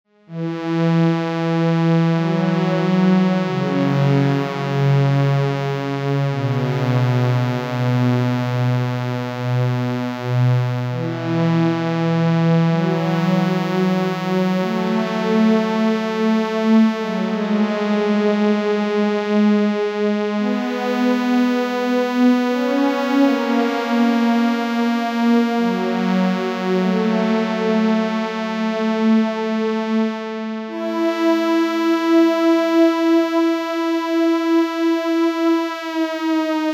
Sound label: wavestation